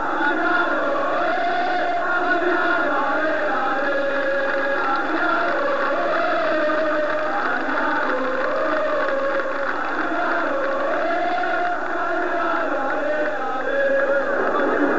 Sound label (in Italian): coro adriano